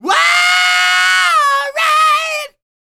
ROCK, MALE HUMAN; ILIO; Vocal Planet

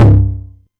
Tom Lo, Novation Drumstation 909

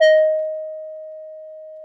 JP8 EB4, Jupiter 8; Big Fish Audio - First Call Vintage Keyboards

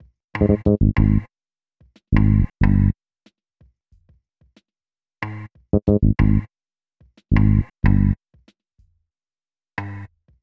Bass, Hip-Hop

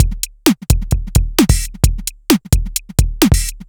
Synth Loop, BPM130

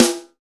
snare ring; Roland L-CD701; Snares 3; Sn Modules 3

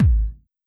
Kick, Drum Hits

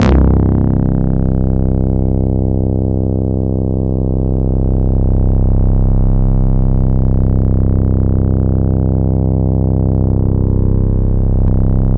AnalogBass c1, Yamaha YX81Z

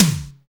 TOMS; DNC TOMS

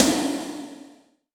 Snr, Alesis D4; Fringe; 300 Drum Machines

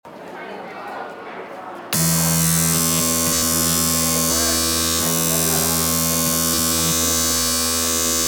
Barbershop type beat finish

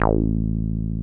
303 A2 mf; Drums In Your FACE!

